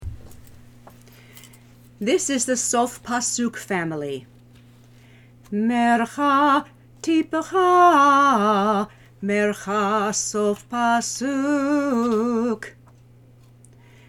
Haftarah Sof Pasuk Family

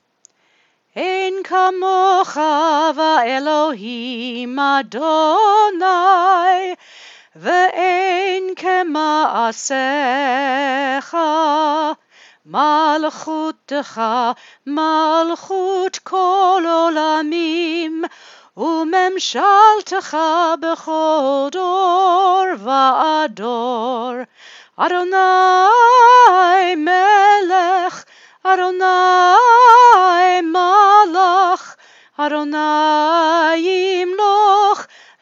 Ein Kamocha Sung

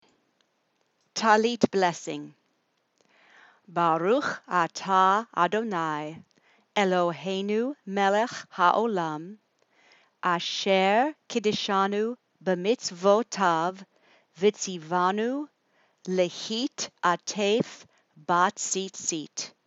Tallit Blessing

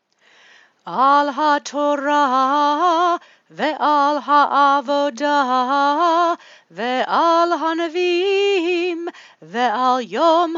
Blessing After Haftarah Chant

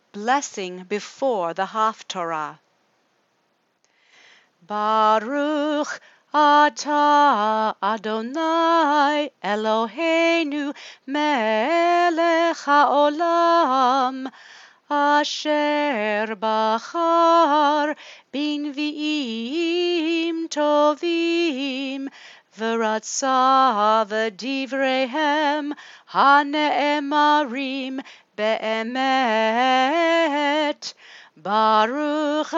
Blessing Before Haftarah

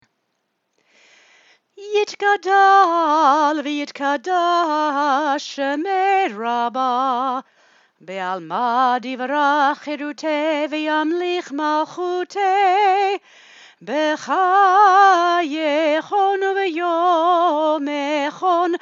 Chatzi Kaddish Chanted

